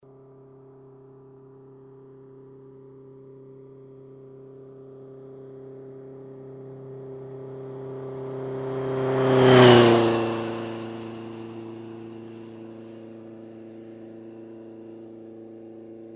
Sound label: Motorcycle passes by quickly